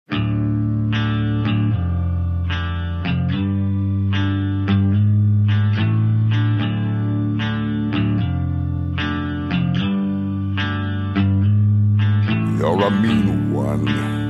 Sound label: grinch rock